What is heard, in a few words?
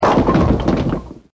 bowling; Mono